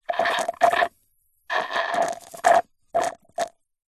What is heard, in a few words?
food drink milkshake thick suck dregs straw slurp